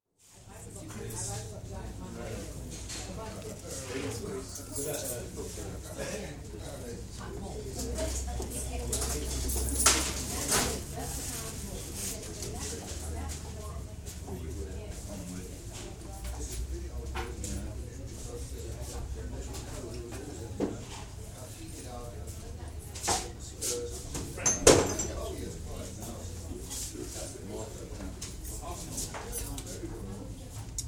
Public Bar atmosphere, quiet crowd in public house with glasses, occasional fruit machine & distant traffic; Win on fruit machine at 2m46s; BBC